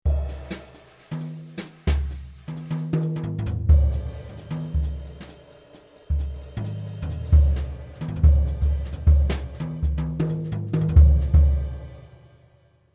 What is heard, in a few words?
Dyn Brush KIT; patch demo; 16-bit, 44.1 kHz stereo; xp-80; roland; roland demo cd-3